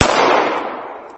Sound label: glock; WeaponSound